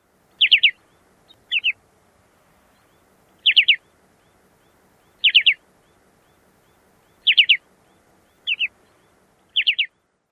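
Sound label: short-billed dowitcher three-note calls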